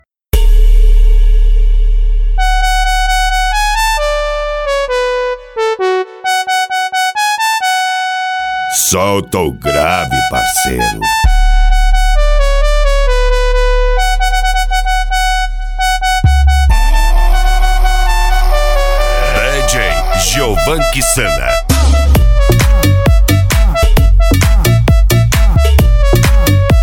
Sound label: funk bass automotivo